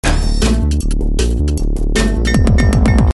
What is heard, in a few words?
Scaffold Scuffle - Intro; Chaos Faction 2